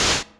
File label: hit; Raimon Zamora; Musica i so amb SDL Mixer